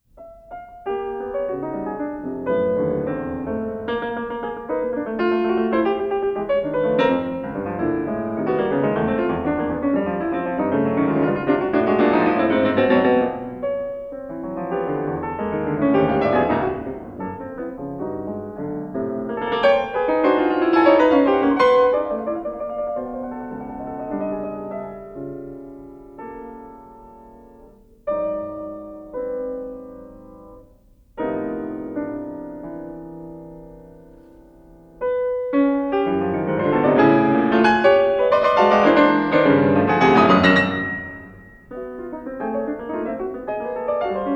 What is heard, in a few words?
Suite for piano; Schoenberg; Op25; Rosen